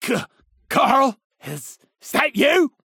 Beer Effect

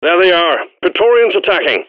Glyphid Praetorian Attacking

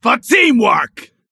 Bar Cheering when drinking

